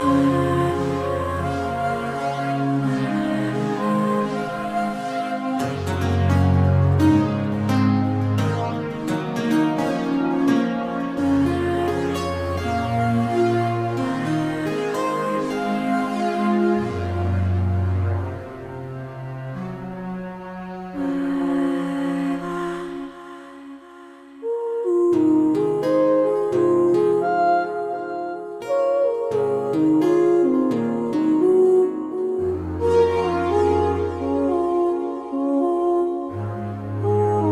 Song Loop

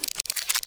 close

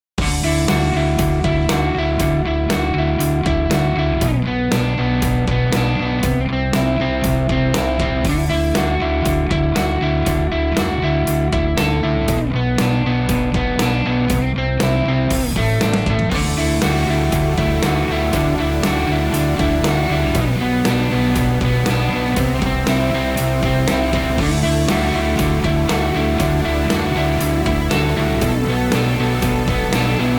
Alt Rock

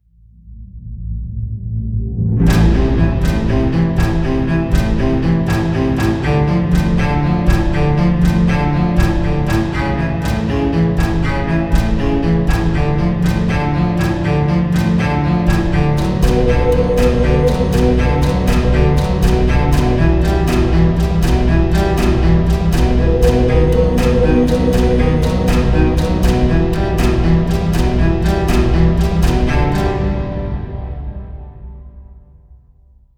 Timer; Zombie Der Riese

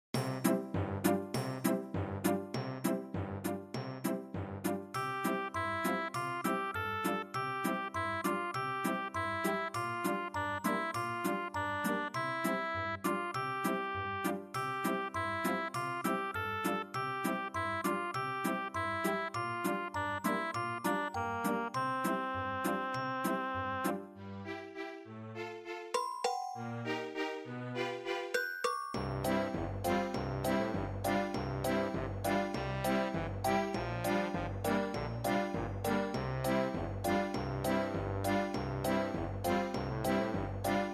MIDI Town; Star Merc

